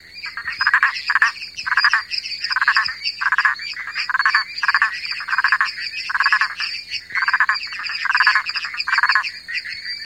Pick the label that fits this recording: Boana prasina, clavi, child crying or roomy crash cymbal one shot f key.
Boana prasina